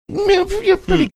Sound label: making proto marsupial gurgle like sound